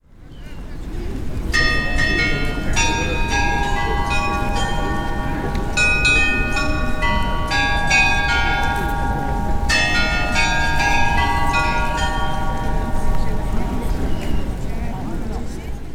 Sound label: chimes; sergiyevposad